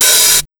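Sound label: TECH 140 hi-hat open, Big Beat Drumloops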